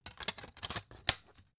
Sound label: cd in cover hard